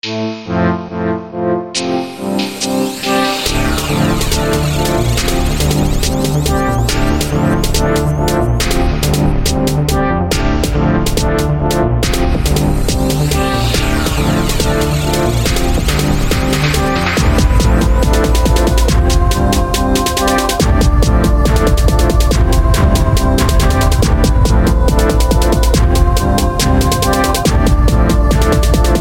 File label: electronica